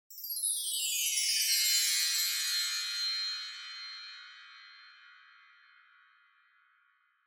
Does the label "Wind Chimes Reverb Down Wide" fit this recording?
Yes